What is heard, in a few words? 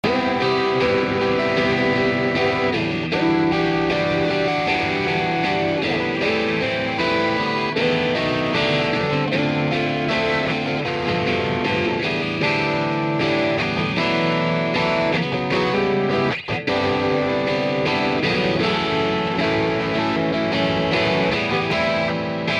guitar before